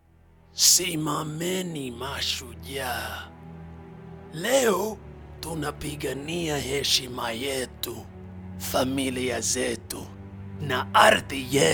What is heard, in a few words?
SWAHILI WAR CRY